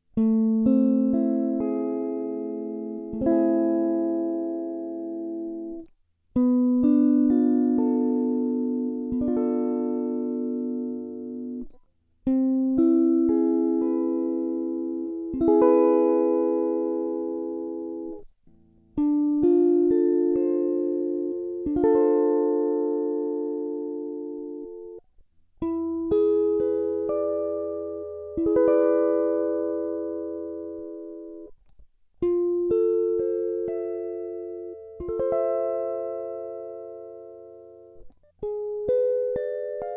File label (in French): harmonisation, Mineure Harmonique